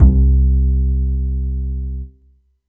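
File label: Kawai K5000W Acoustic Bass C2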